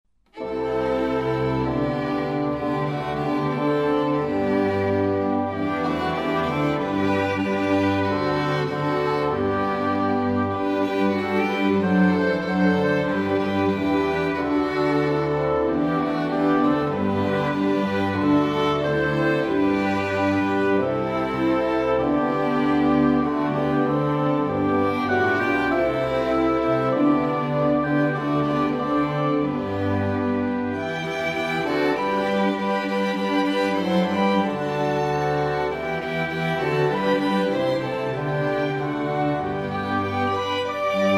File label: only orchestra accompaniment